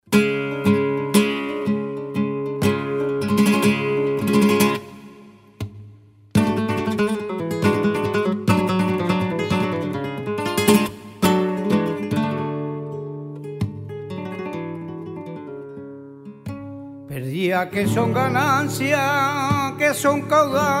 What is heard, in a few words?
Solea, flamenco; Apola